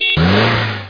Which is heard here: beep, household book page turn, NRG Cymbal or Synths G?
beep